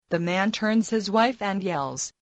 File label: men yells